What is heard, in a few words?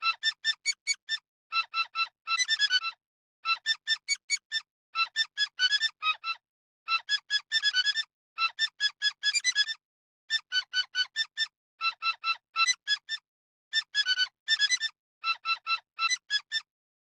washing machine